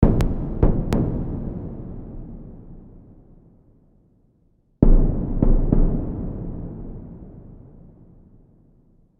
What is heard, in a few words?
Deep Drums; the letter ps4 wii u windows gamerip 2014